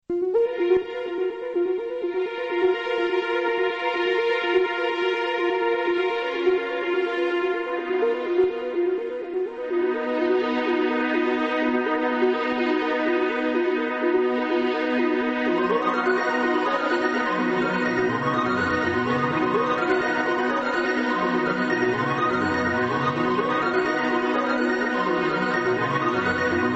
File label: ambient